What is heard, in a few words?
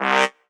Synth Sound; Vengeance Samples; VEE Synth Shots